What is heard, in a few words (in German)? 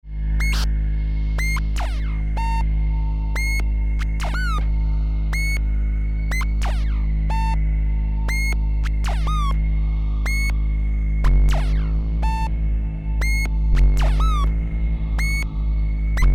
Landscape Noon mit FX